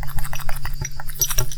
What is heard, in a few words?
Hose